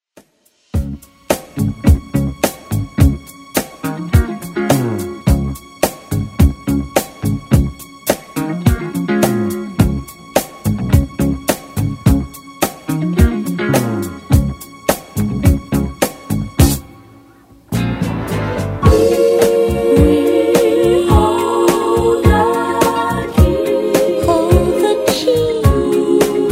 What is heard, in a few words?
80s redrum 106 bpm